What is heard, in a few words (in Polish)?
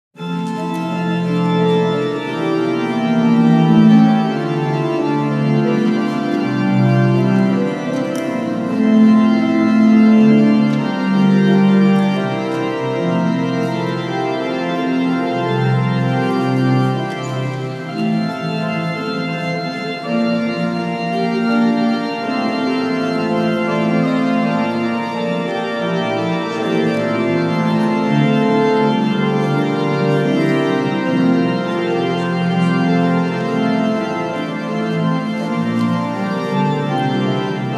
Organy cysterskie